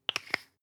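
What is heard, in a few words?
toothpaste close twist hard, Bathroom; haus-garten; badezimmer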